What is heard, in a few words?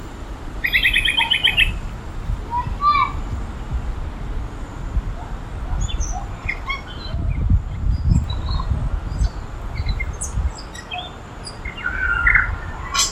bird, NZ; Blockhouse Bay